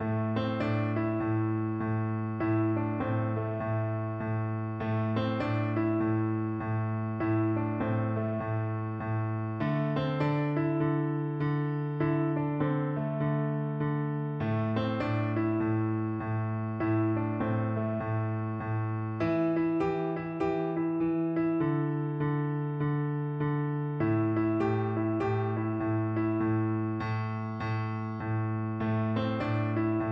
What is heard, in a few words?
cool blues